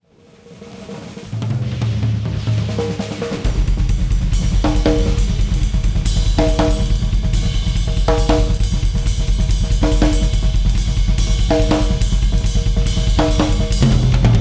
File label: Drum Alert; PlayOutLoud